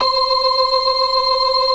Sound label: BLOOD OCT, Synth and Organ